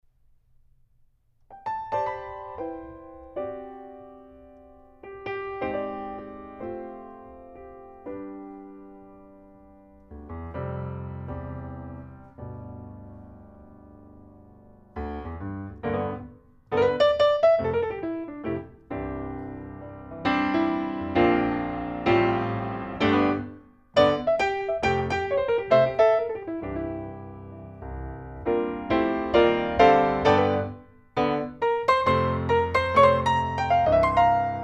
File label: piano eight; lewitt lct640ts